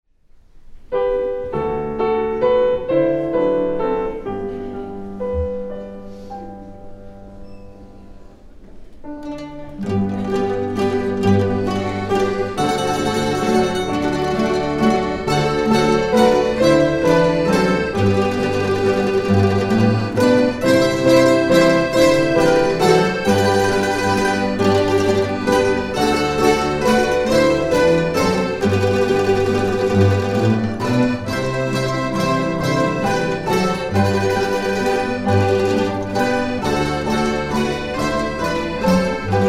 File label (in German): Vorspiel Orchester